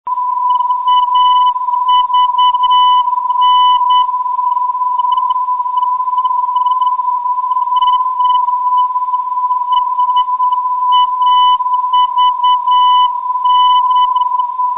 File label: 385khz, ndb; avn